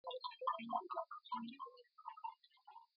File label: water drip fade out